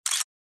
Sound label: take a picture click